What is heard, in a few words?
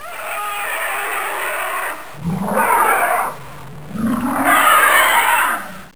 Triceratops Roar; Saban